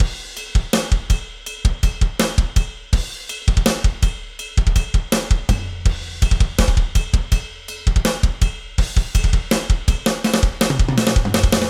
Main Riff Drums Without Extra Samples, modern metalcore